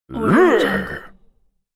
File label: viking throw yell